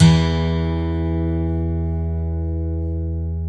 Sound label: 12 STRING E, Twelve String Guitar